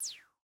laser; buzz

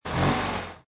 Rev Short; Luigi; cars

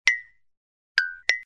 blink; disney; mashup

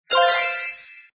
power up gained; holidays; snowbrawl

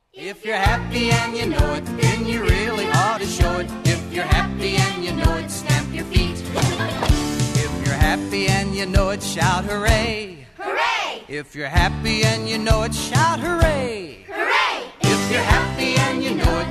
karaoke vocal; childrens favorite songs; disney

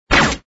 laser; marvel; city under attack

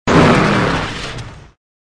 land accelerate; Cars; tractor tip

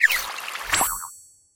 watering flower and blooms; activity center; backyard; preschool time

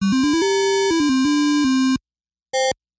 pong win; toystory; aliens

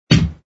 menu over; marvel; city under attack

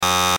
bad Catch; disney; best frenemies forever

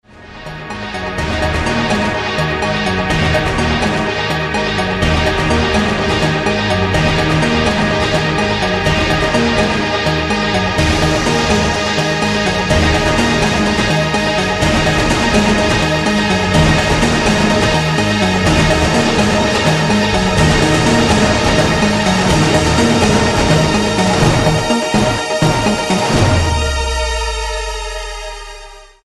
remixed; tron

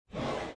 drawer short

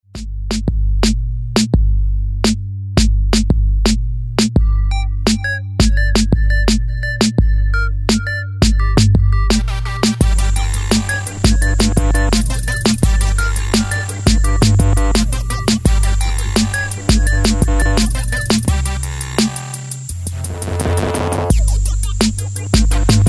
karaoke; disney

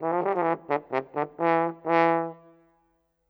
Cinematic Horn; pooh; merry games; jammin

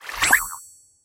flower blooms; activity center; backyard; preschool time

